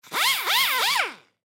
Die Grinder Rev, Die Grinder Rev Pneumatic; Grinders (Sound Effects:Machines); Blastwave

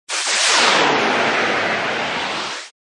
Scifi Production Element Friction Static; Lynne Music

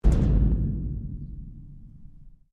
Strike Metal Hollow Tank Soft; Materials

